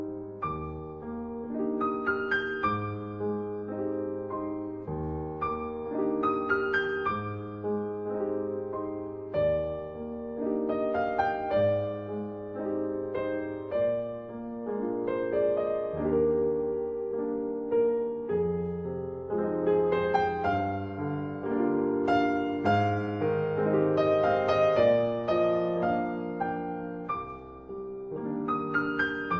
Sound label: Andante sostenuto Piano; 2nd Mov.; Kairi